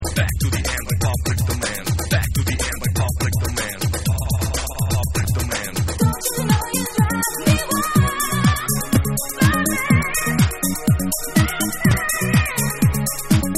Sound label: Techno Scratch Mix